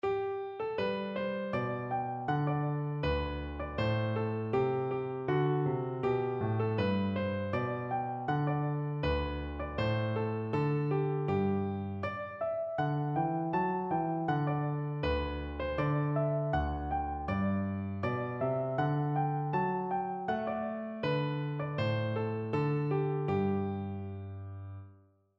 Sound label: rising of the moon, piano